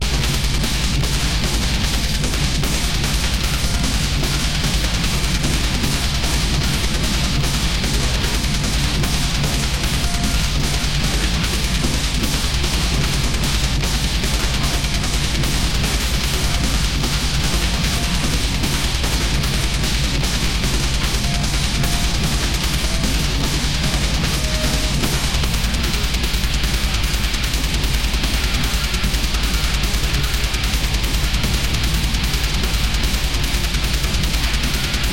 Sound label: death metal fast riffs blastbeat; СГЕНЕРИРОВАНО!!!